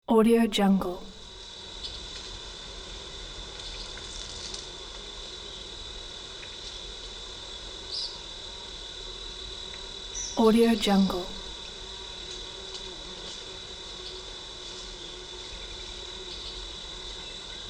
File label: nature ambience